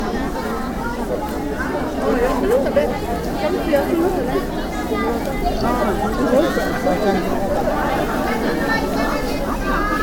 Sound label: small bazaar